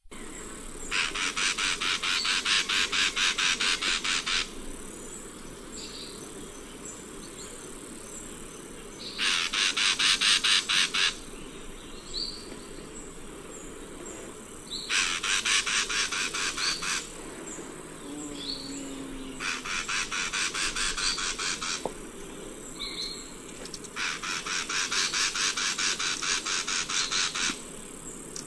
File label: Habia rubica